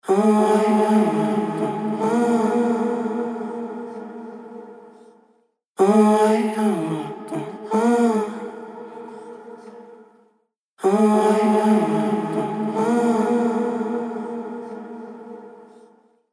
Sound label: Harmonizer Vocals Dual Bouncing Ball Kick; H949 Harmonizer